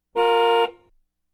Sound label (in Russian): Signal avtomobilya